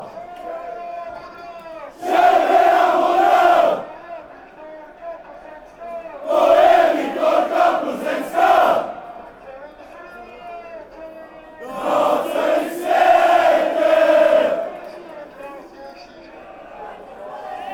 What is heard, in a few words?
fanchants